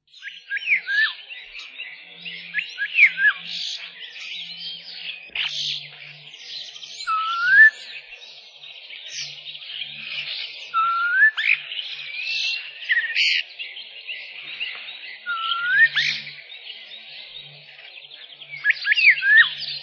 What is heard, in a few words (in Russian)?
skvorets